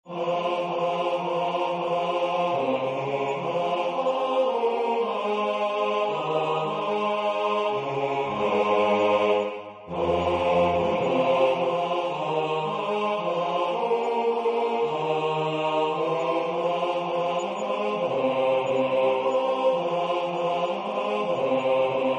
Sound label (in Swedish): Folkvisa från Gotland bas